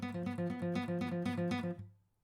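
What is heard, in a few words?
acoustic pattern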